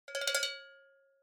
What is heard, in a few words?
Cowbell Roll